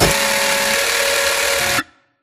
Screen Glitch Long; Boomtown; Titanfall 2

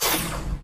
bullet generic titan shield scatter; Titanfall 2

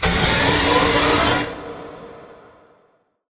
Giant Pillar Pods Move; Titanfall 2; Boomtown

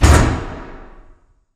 sliding door sm verb close stop; beacon; Titanfall 2

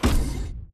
footstep jog close; Titanfall 2

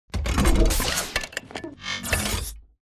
embark casual left; Titanfall 2

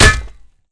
Imp Bullet Light Ballistic Solid Metal

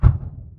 footstep jog; Titanfall 2

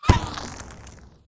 imp bullet lightballistic impactsweetener

